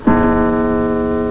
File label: gong